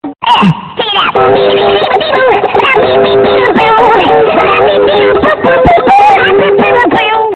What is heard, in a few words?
funky frog ringtone